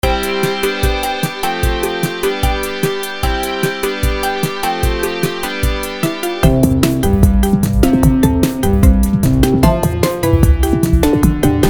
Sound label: boss instrumental